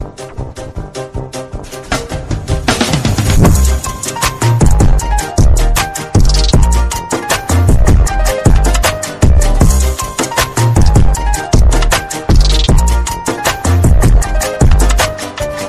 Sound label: bad piggies drip